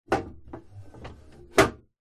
household fridge plastic compartment movement bash; zapsplat